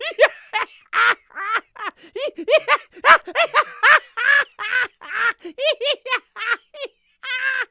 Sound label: laugh, laughter; AKAI S-Series CD-ROM Sound Library VOL-6